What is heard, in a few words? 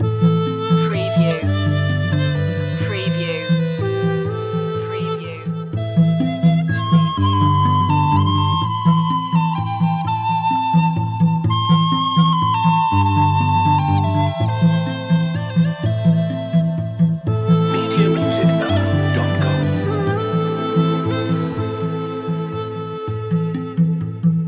mountain lake loop